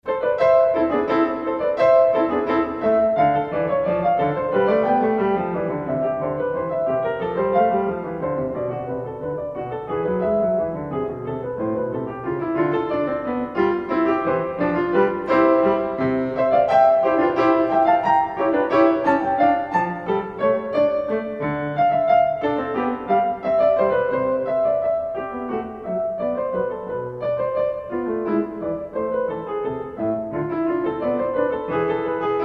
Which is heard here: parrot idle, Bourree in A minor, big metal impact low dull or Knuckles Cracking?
Bourree in A minor